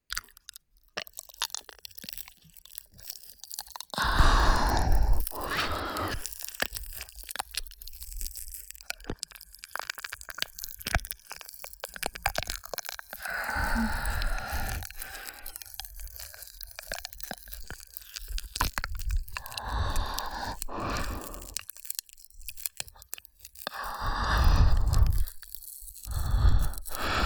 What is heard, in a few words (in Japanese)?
耳舐め（右）舔耳